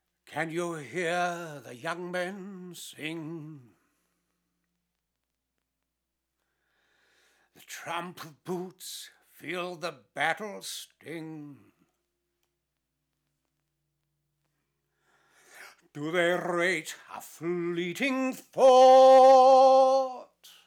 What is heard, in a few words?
So Many Vocals